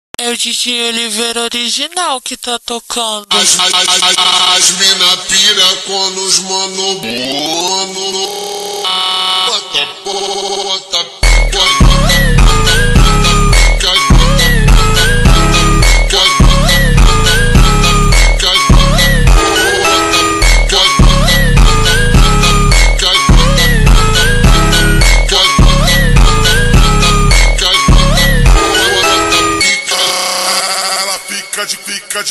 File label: montagem ultra slowed high bass boosted